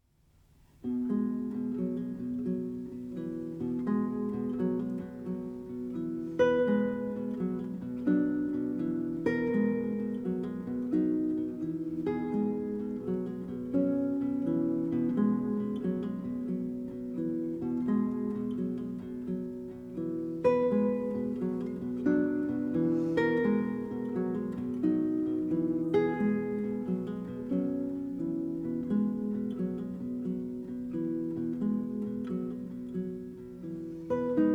children s song arr for guitar classical; classical session

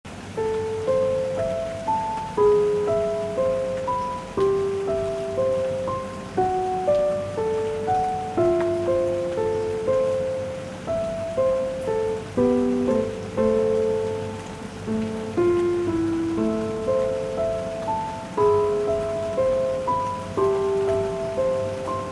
lullaby version with rain

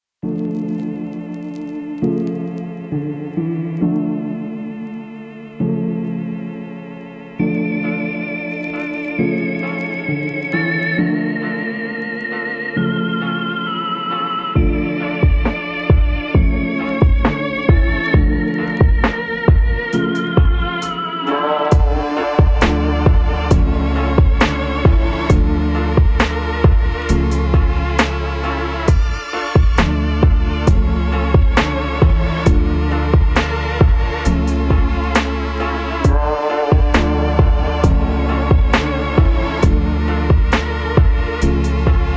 spooky tape lo fi

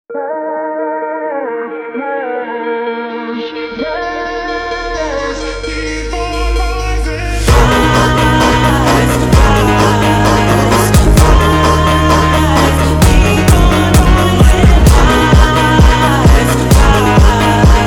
rising funk

